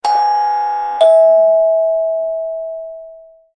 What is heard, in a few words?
doorbell; doorbells